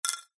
metal screws several small drop or set down on concrete paver; industrial